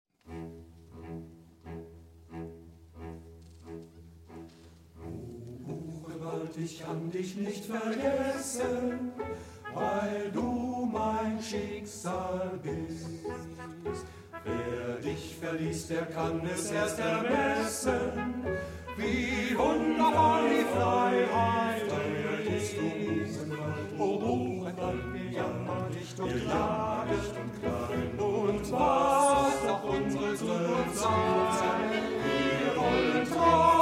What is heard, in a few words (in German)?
Marsch; Buchenwaelder Marsch Reminiszenz